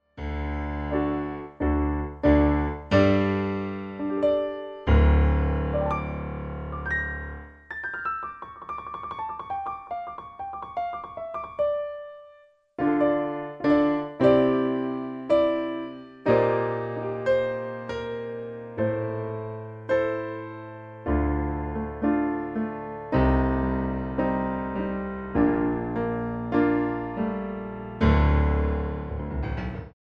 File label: Roland FP50